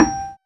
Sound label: PNO, Electrics, Rhodes; Club-50 - Foundations Roland